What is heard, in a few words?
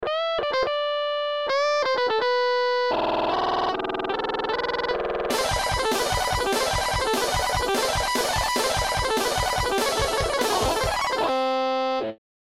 Guitar Solo; Wings Of Reality